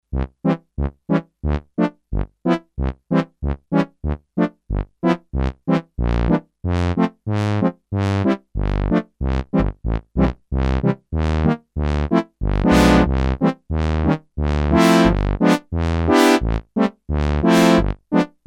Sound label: Brass, Sequential Circuits Six-Trak; Factory Programs